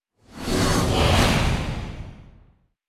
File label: Boss Normal Attack; starbites